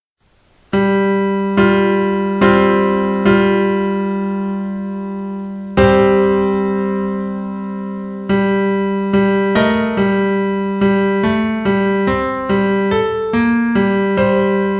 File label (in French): musique dans la cène; illusions dans la peinture; les secrets de la cène